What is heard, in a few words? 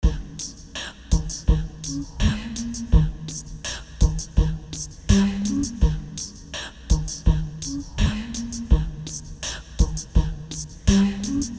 Vocal (Beat Box Style)